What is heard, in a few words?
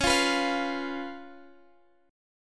Db 7th, Guitar Standard; strummed; x,x,11,10,12,13; JGuitar